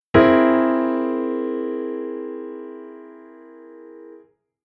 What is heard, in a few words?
Chord Aug